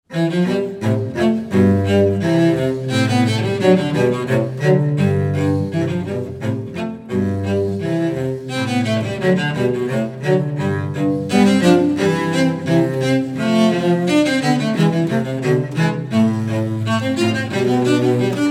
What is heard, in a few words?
Boismortier Gavotte